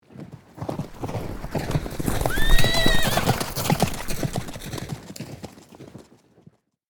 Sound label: Horses galloping fast pass by